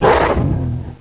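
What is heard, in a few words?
orc death; Renaissance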